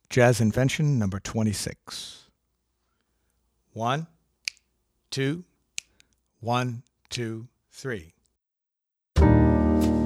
Jazz Invention No. 2

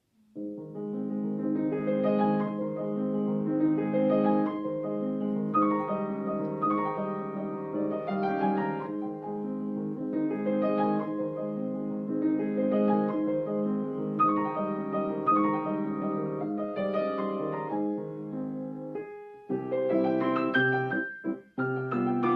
Etude in A Flat Major; RC Celebration Series Level 10 Etudes; Op.30 no.2; G. Concone

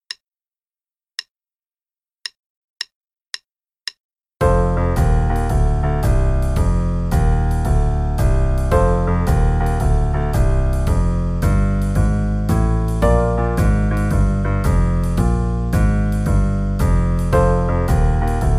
Cool Walkin' Bass (with bass and drums, qn=112); Level 3A - 2nd Ed - page 40

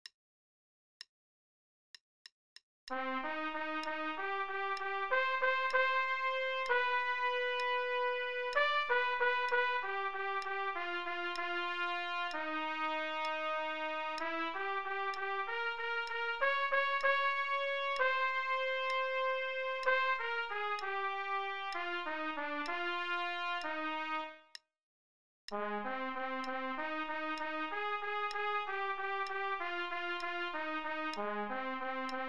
duet, lessons Trumpet; Gatti

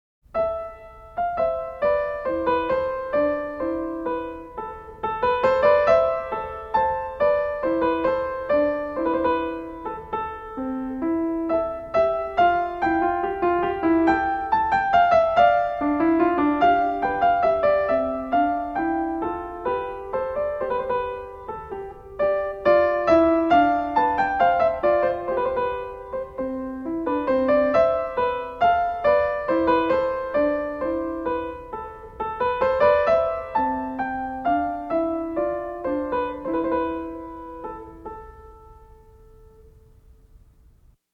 Menuet; Magrath Book 04

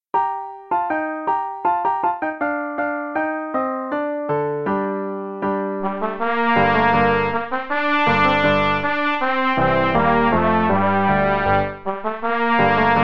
All Aboard (no click, hn=80) no dynamics, Trumpet; The Really Easy Trumpet Book

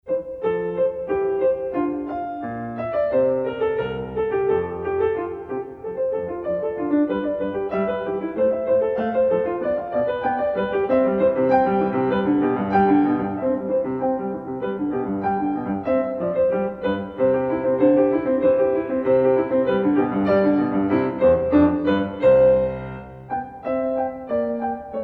Bourree In F Major; Marlais Book 5

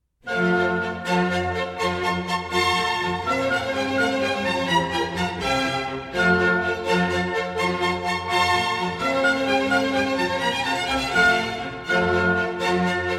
Symphony in F major; Mozart; KV 112 (as in Faber Book C)

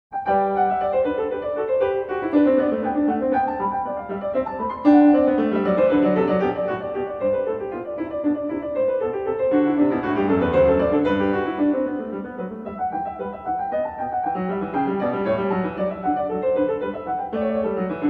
Courante in G Major; Marlais Book 8

